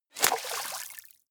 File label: bullet small impact water; krieg-waffen; geschoss